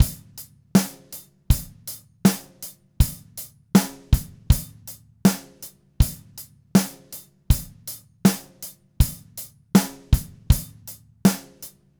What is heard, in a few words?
Green Maple Groove 80, Vital Drums, DW Green Maple Kit, 80 BPM; Sonic Foundry (Sony Creative Software)